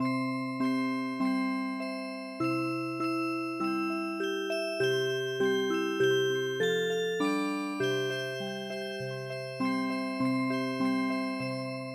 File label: Music Box, midi